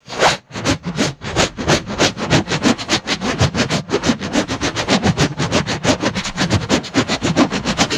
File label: fast hollow rubber hose swinging whoosh; swooshes